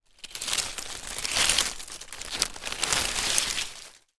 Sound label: foley dried leaves twigs movements